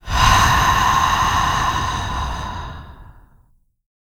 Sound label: VOX FX, BREATH FX; Spectrasonics; Vocal Planet; Jazz FX